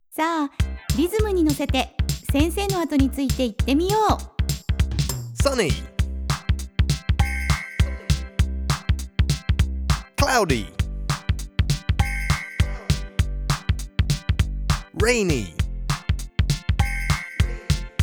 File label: motown; Kindergarten Practice